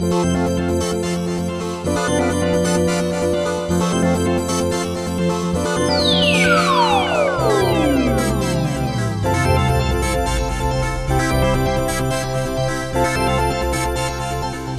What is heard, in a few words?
Victory loop; cubix robots for everyone showdown; gamecube